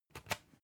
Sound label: usb port metallic out hard